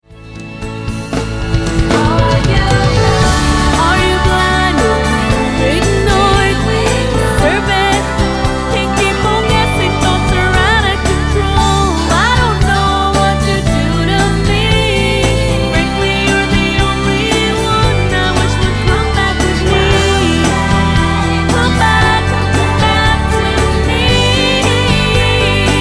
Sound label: Folk